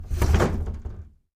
fusuma open

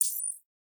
decision, button

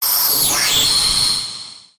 magic statusup

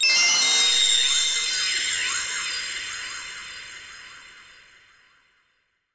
magic cure

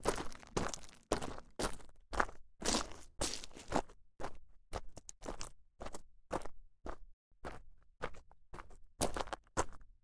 walk gravel

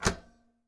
microwave close

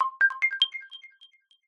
description window, button

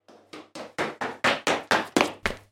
dash in room

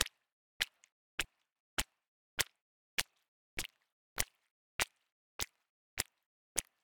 walk wet

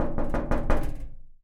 door iron knock

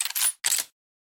sniperrifle boltaction

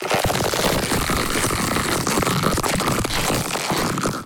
constriction, battle

